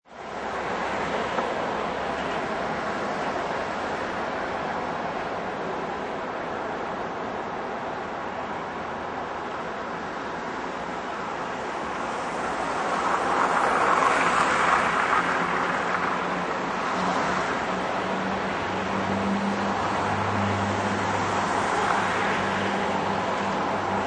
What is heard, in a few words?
City Traffic On Traffic Bridge; Perspective in the middle of traffic, surrounded by cars and trucks driving b; Traffic And Car Sound Effects